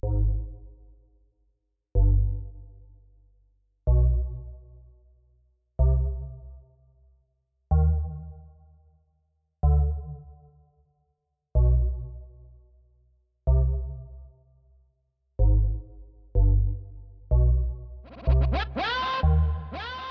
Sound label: Electronic Candy Club